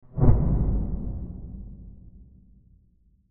Short Transition With Long Reverb, scary soundscape; CraftedSignals